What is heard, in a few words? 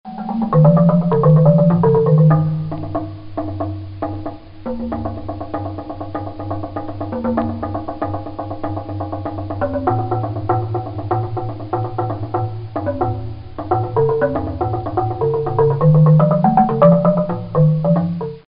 Bass Marimba